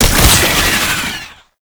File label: Latch Detach; Roathe Summon Gulphagor; Warframe; Tau